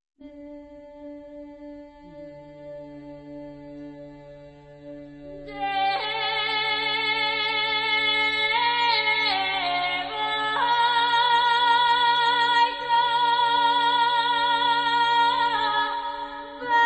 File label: Bulgarian Polyphony; World Sounds